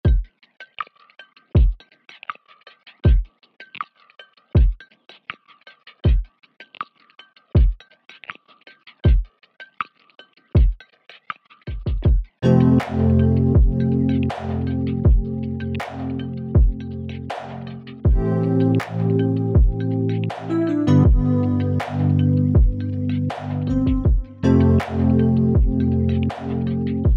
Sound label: Startup; Dynopunk